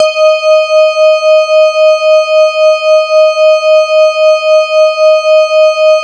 E-HARP, DX HARP; Wizoo - Powered DX

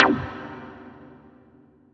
Stab C2, Club Techno; Zero-G; Stabs

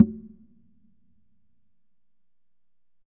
Congas Wet

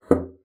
BASSOON; Xsample VOL-6

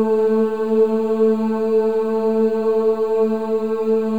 OO A3, women; Spectrasonics; Symphony of Voices; WOMEN OOS

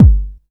HOUSE DRUM BD; AKAI S6000 CD-ROM - Volume 1; DRUM RYTHM

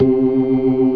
ORGAN, NO PERC

